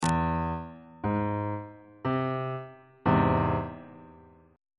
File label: e augmented chord on bass clef